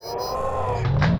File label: plasma draw; Dystopia; weapons